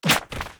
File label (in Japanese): watch kirari